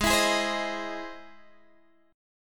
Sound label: E Augmented Major 7th